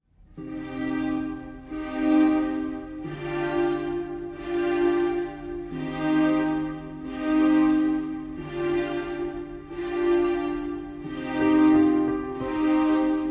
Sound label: no solo cello